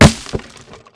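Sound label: grass; Mafie 1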